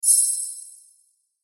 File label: Cartoon Magical Shimmer High Bright